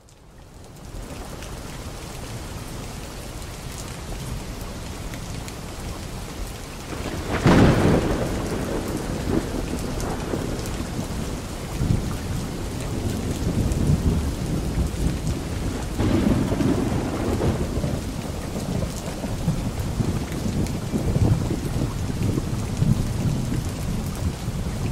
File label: rain trenchassault; empires